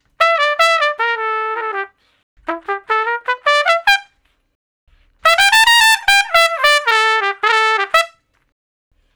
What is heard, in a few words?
Trumpet Shuffle (E); Solos